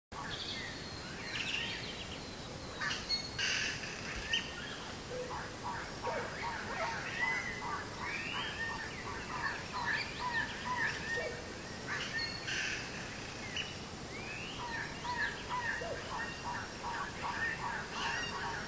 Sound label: jungle birds